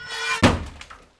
shutter, ambient

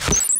headshot kill; hl2dm; sf vox

